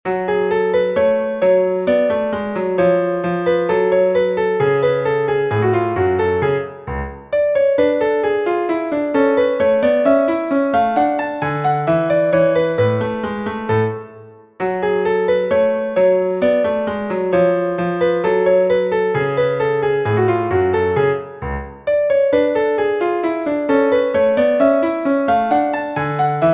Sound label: poco andante